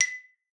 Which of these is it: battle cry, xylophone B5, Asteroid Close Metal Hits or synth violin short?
xylophone B5